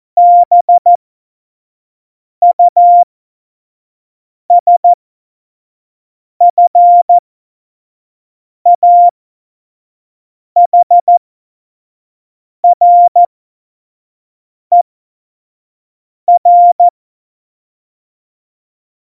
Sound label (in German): Witz, Morsekurs, Tempo 25; Witze